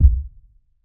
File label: player heartbeat bump, damage